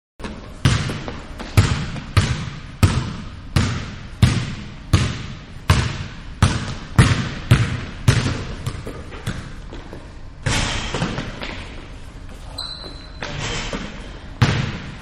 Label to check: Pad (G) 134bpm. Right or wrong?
Wrong